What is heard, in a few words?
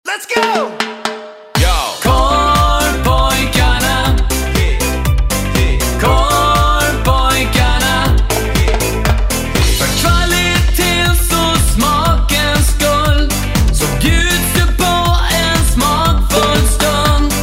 reggae